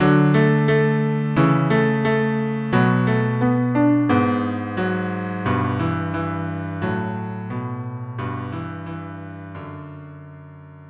cello duo

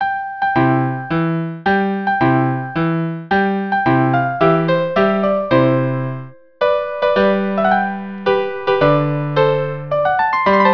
minuet in C for musical clock; haydn

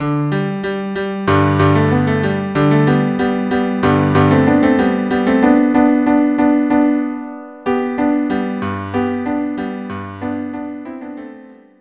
cello duo duo; La Rejouissance

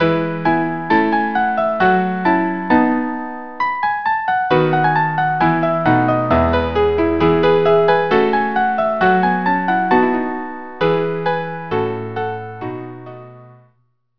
gavotte en rond quartet

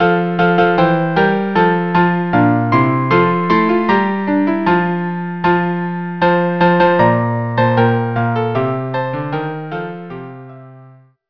trio

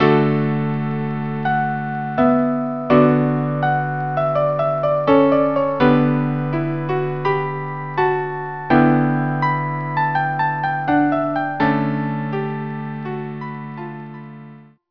trio

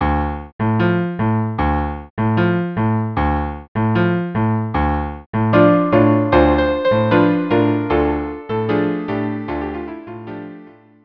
habanera violin viola cello; carmen

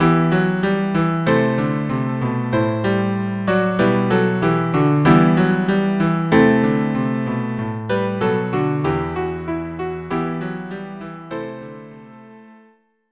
cello piano

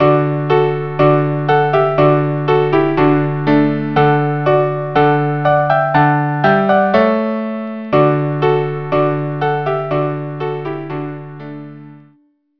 trio

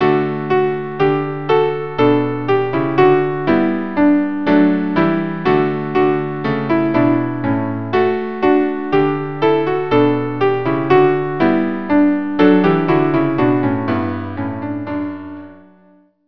string trio

